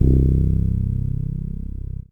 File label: Kawai K1r Bass C2